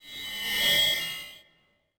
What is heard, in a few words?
feedback fade in